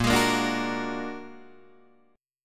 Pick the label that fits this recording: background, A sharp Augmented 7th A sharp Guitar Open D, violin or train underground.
A sharp Augmented 7th A sharp Guitar Open D